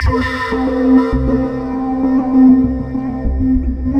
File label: Synth Textures